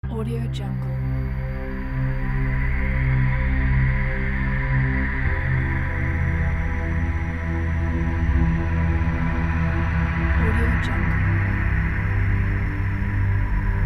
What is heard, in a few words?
dark atmospheric soundscape; pashastriker